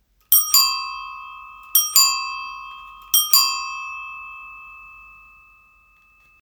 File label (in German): Ding-Dong